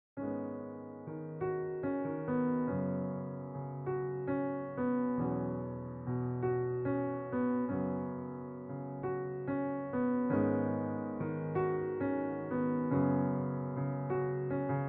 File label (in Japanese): ピアノ